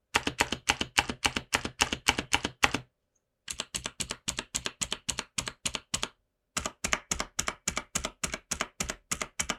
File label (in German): Leer Zurueck Enter